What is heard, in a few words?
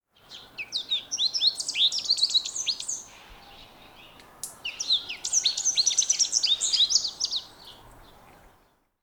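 American goldfinch spinus tristis, birdsong; peniya ptits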